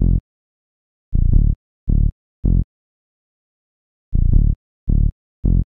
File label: SNTH BASS AMBNT 160 C; Ambient